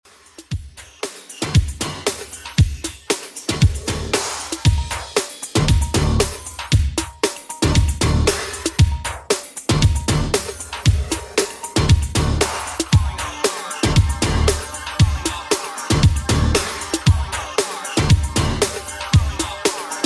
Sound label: 80s Redrum, 116 bpm